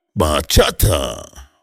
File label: bachata dj